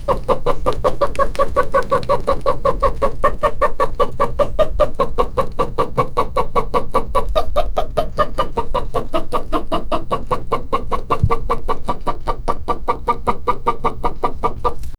a chicken running, farm

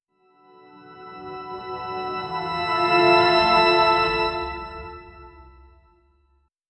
music swells and fades

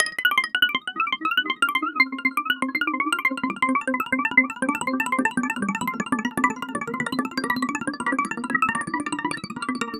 short ascending, organic

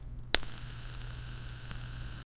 fire

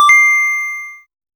collect coin in casual ga; digital

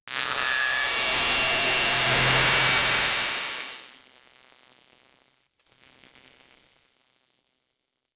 electrocuted lightning, vfx

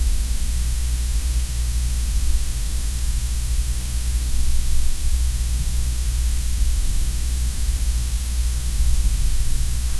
sensual skin caressing

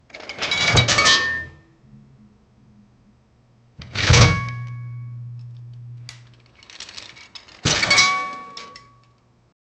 metal crate; 1 second

